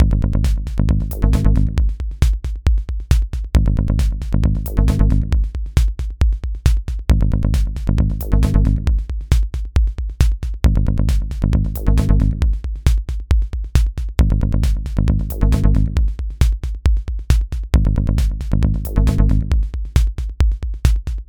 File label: Acid Cinematic Loop